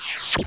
confirm; sunrisechat

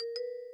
sound click

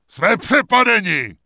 Grunt Unit Attack; wowpreklad; czwow

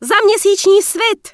Night Elf Female Cheer; wowpreklad; czwow; NightElfVocalFemale

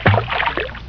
splash; hofclient

